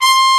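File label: Trumpet mf C5; Digital Sound Factory Virtuoso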